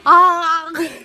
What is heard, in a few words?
augh